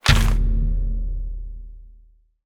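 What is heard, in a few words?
spell equip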